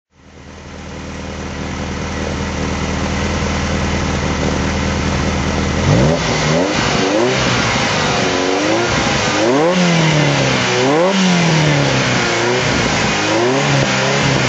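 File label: ferrari 238gts QV throttle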